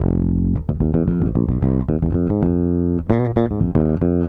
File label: Raw Funk Bass